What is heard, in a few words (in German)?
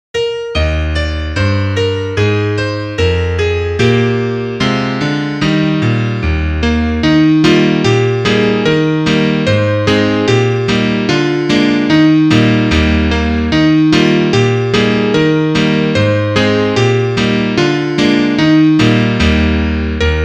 Klavier; ein maennlein steht im walde